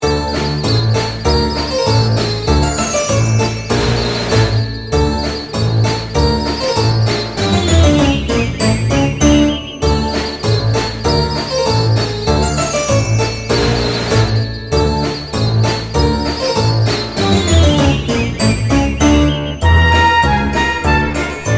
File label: Raid; Mist Train Girls